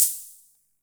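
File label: Olson Cym B, cymbal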